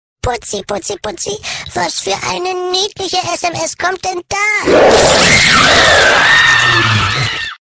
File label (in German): niedliche sms